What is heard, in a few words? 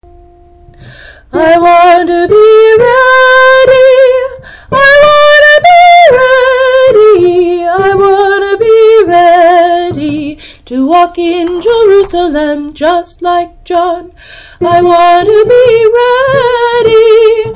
alto